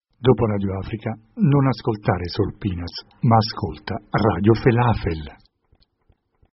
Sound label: jingle; radio felafel; RADIO AFRICA